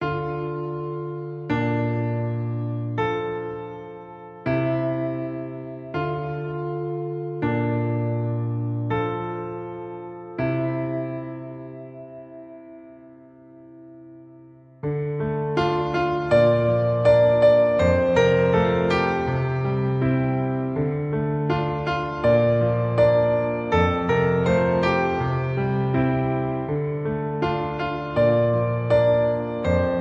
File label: Piano Cover